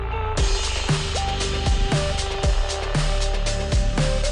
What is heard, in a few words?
xperia new sms